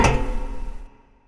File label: foot metal; Earth and Beyond; EA Games